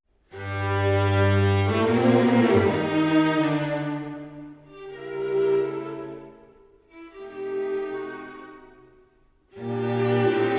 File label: String Quintet; Spohr; Nr 5 op106